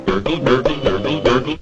cartoon glug glug

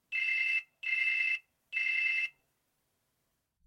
classic phone ring

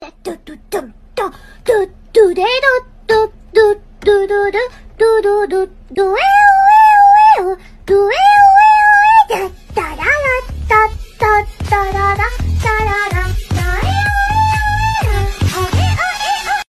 do do do weeoo weeoo

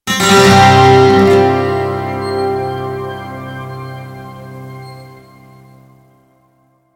windows version

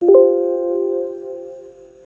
windows 10 background sound effect

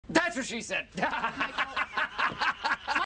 melody

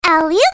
ayuuu

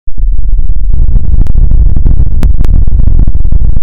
artificial thunder

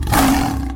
lion roaring

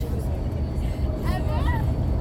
ebahhh

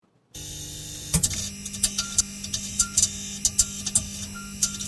lights flickering

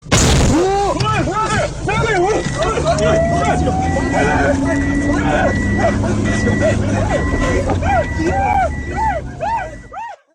jp screamer

